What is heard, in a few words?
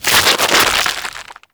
Ghoul Devourer Hook Tongue Projectile; Warframe; Grineer Ghouls